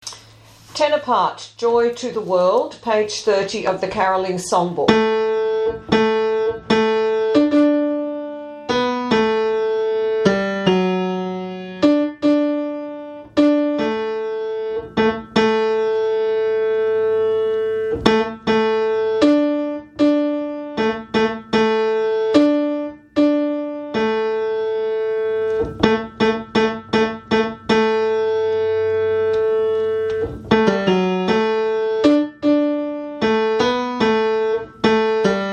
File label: joy to the world, tenors; Blue Book